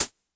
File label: High Hat Closed; High Hats; MisfitChris Lo Fi Drum Pack; chiptune